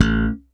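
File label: SBF A0 SHORT, FUNK BASS; Northstar - New Gold VOL-1; SPEC.FUNKBAS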